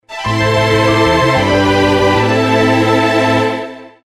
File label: Jingle